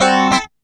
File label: FUNKY, Grooves